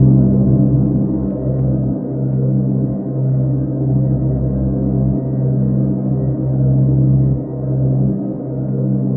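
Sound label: ATM HORROR, Production Effects; Best Service - XXL Production Effects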